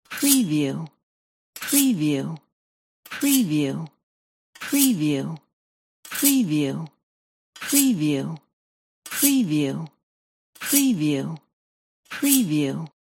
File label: pulls out a knife